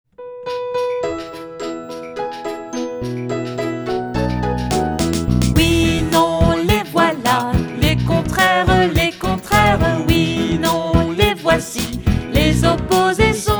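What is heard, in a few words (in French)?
les opposés, comptines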